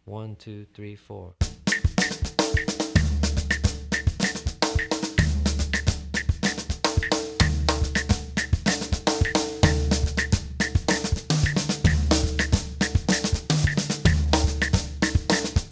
conga de comparsa variations

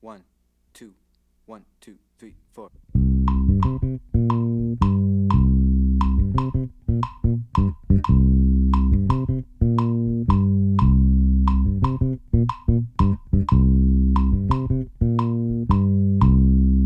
bass mozambique modern

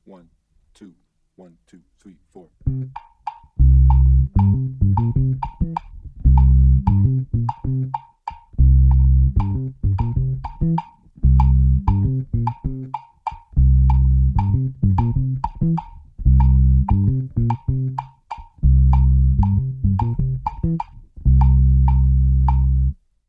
bass guaguanco descarga

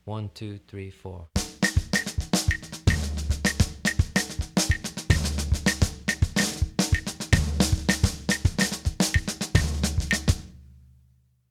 conga de comparsa basic

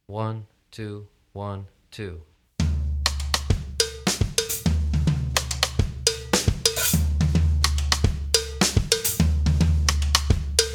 merengue songo hybrid

